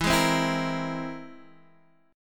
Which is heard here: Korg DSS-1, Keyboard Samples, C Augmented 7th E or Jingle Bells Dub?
C Augmented 7th E